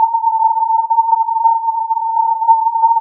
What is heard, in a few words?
filtered input